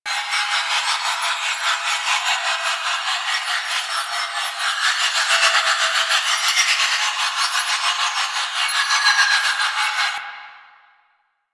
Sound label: Infested Mist Lantern Loop A; Warframe; Infested Eris; Encounters